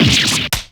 damage; uendo

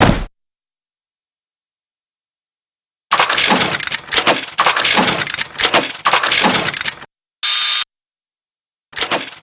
get up; drebber

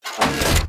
retract; sarge

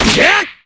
roundhouse kick; kurogane

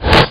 wipe

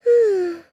hmmm; sayaka

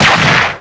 damage; sarge

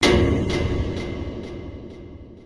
creepy shock; yttd

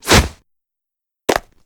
badge; bobby

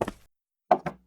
sit down

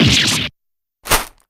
damage; ellen

